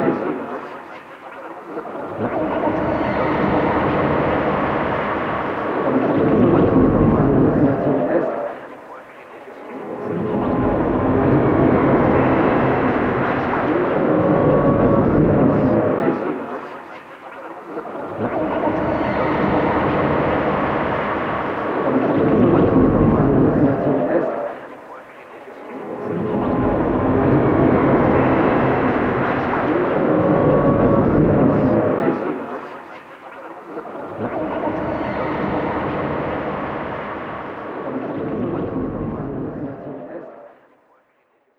Music Loop

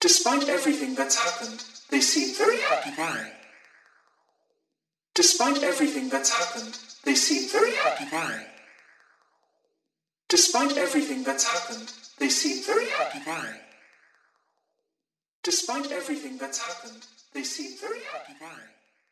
Glitch

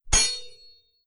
Sword; Legend of Keepers

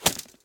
Arrow Hit; Folk Hero

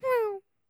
Grub Sad; Grub Sad 1

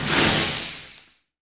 Damage Poison

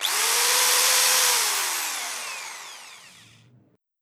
Drill; Human Hunter

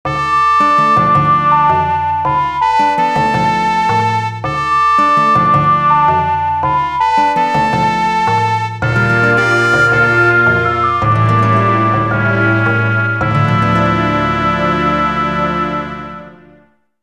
Quartet; Milkbar Quartet

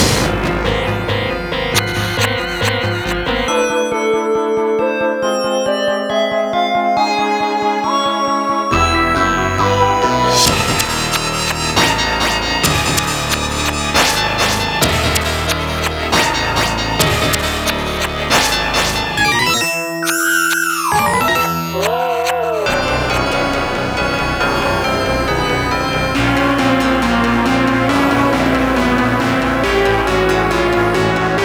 Horror HAUNTED HOUSE LOOP; deathwish enforcers

